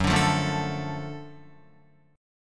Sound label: Gb Minor Major 7th Sharp 5th Gb down Guitar; strummed; JGuitar